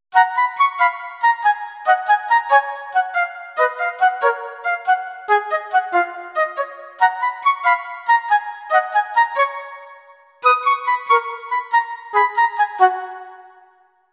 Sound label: jingle